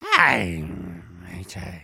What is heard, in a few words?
Stunned, creatures organic